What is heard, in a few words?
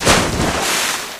pontoon splash, airboat; Source Engine; video game; vehicles